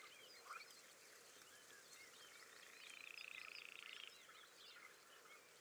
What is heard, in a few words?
male bird